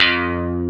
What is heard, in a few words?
FRET F1; E-MU Formula 4000 Series Vol. 3 – Analog Odyssey; Vintage Combo